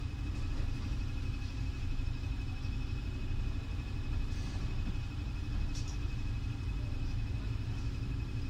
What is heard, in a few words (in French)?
ambiance, Metro